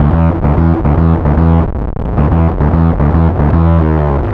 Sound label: Bass, Distorted E; Bass X